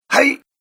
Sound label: Karate Male Strength, recorded voice; Karate 1 - Male Strength 10; Recorded Voice Sound Effects